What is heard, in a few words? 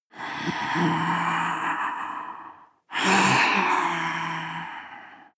breath female moaning breath angry